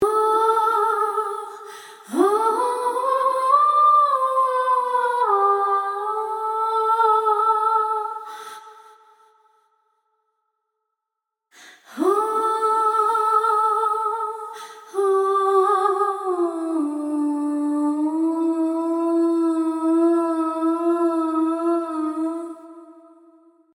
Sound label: oh2, vox